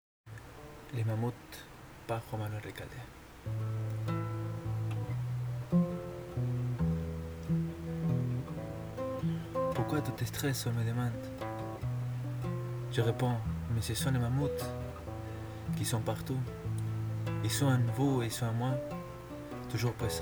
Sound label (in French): chanson accord